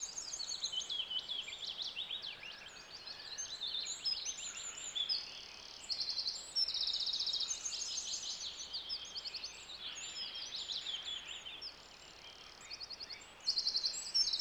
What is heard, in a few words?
Forest with Birds Chirping